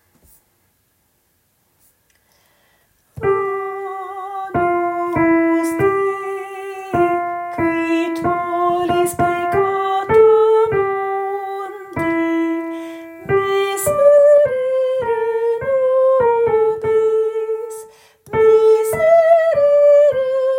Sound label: Agnus Dei Sopran